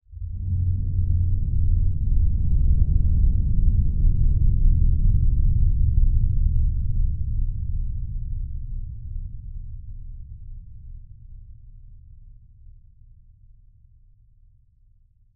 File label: suspense; DayZ-Epoch; dayz sfx